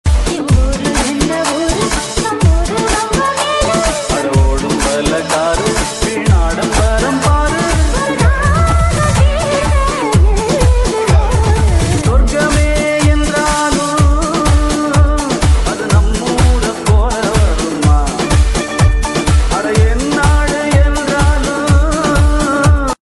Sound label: REMIX RINGTONE; GOAT